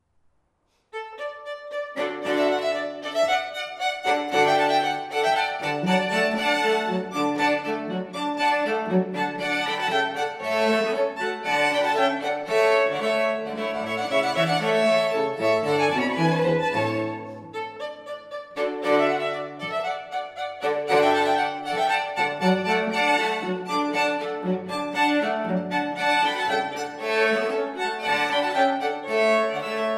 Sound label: Open Concert Hall, string quartet; La Rejoysance